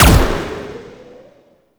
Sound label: Rifle Alt Shot; Weapons; Warframe; Zariman